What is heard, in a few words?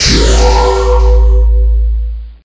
Bonus Exit; Twingo